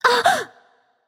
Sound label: surprised light; smite; voicepack; hel